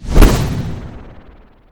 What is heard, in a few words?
magic missile